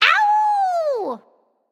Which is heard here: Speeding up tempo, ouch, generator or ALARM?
ouch